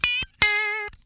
lick scale, funk guitar; Zero G - Funk Guitar

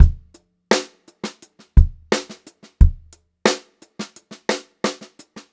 87 STR BEAT, Funk-Groove Drumloops; USB Soundscan vol.38; AKAI

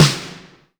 LW RM SNAR, Drum Mashines, SR-16 DRUMS; EdgeSounds

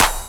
DR. LOOP; X-Static Goldmine 3

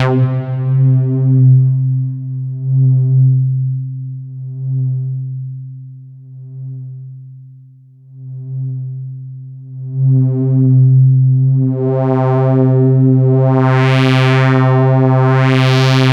Roland JV 2080, interval; MG INTERVAL

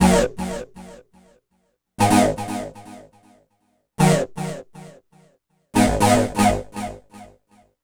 NRG Lead, trance

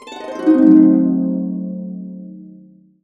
C DIM7, Harps; USB Soundscan vol.62 - Complete Harps; AKAI

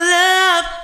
"love", Female Vocals; PlatinumPhattCD2; E-MU Producer Series Vol. 8 – Platinum Phatt

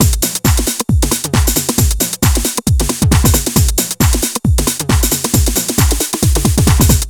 DRM, LOOPS 135, House; Best Service ProSamples vol.10 - House; AKAI

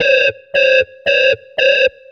FX, Futurist Drum & Bass

